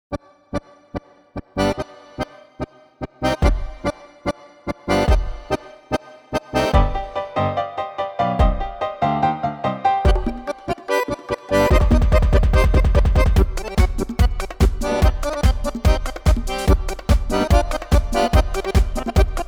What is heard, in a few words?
Samba